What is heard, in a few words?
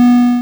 pong quit; w00tguy; arcade